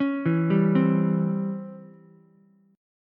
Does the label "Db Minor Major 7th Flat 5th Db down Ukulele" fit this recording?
Yes